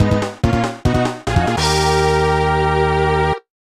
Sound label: Victory; mega man and bass snes